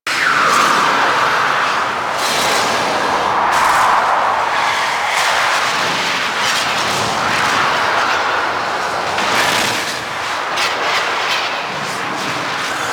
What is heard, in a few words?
Void Storm Close Wind Loop; Warframe; Railjack